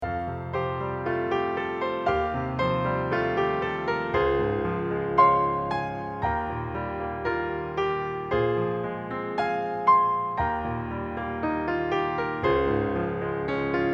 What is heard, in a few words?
accomp